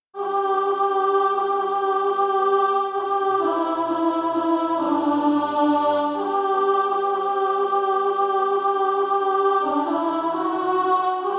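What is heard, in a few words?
alto, SATB Practise Music; Dying from Home and Lost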